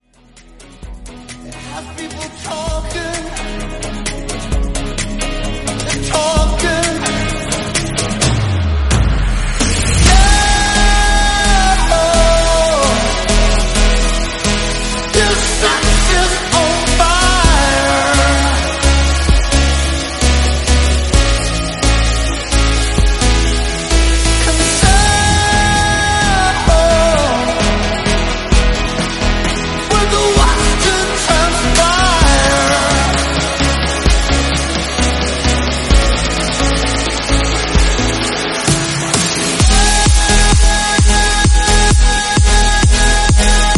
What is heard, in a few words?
Remix Snippet 130bpm E